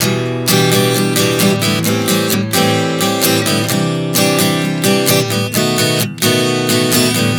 Em7-Am7-C-B7 130 bpm, acoustic guitar chord progressions